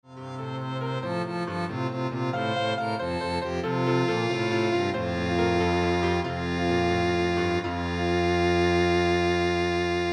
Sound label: Piano Trio